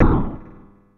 Stone Drop; Kirby; Super Smash Bros

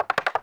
Rotating Barrel

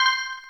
Magic Ring; New Super Mario Bros. Wii

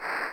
Slide

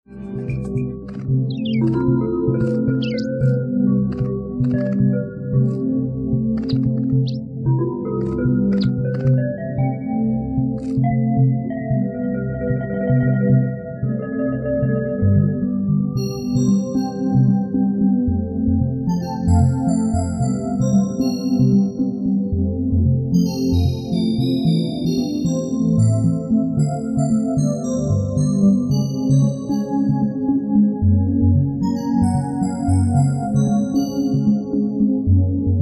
Beach Level Underwater; SM3DWorld Soundtrack VOL2

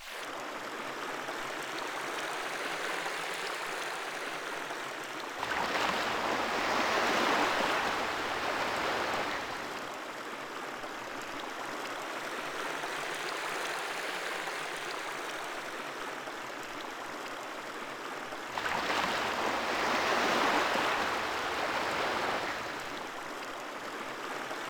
Ocean Ambience

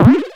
Ball Hit

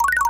Red Circle; New Super Mario Bros. Wii

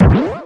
World Map Hit By Enemy; Wii

